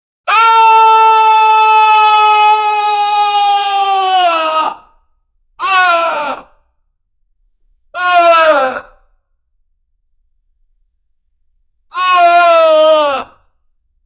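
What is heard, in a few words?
sound of a person screaming; workplace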